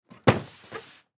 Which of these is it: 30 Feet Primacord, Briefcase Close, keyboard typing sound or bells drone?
Briefcase Close